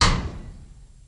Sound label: Axe Car Hood Bright S, Axe Into Car Hood, Bright Squeak